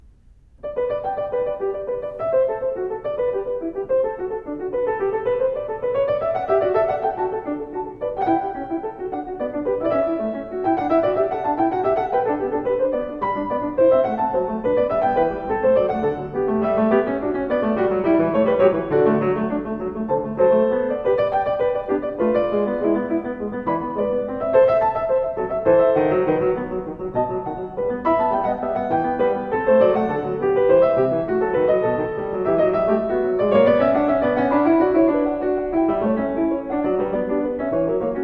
Prelude And Fugue No 15 In G Major BWV 884 Fuga, Clavier; Johann Sebastian Bach